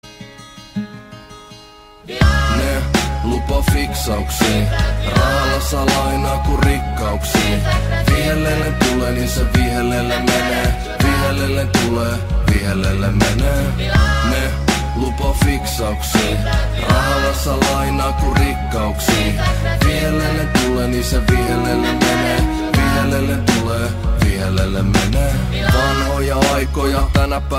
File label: ringtone